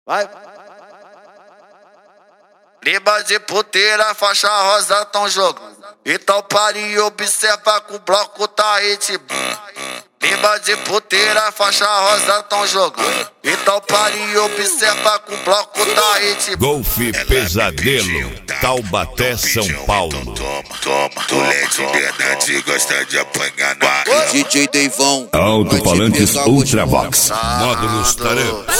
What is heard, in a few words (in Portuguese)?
funk frequencia; taubate sp